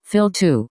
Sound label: Fill